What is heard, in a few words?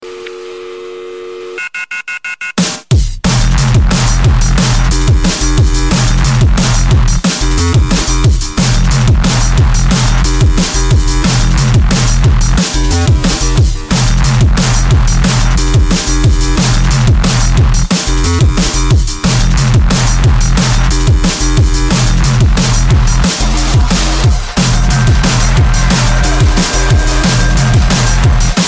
dnb, ringtones electronic